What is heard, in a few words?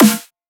Dubstep Snare; Ghosthack; Dubstep Sound Arsenal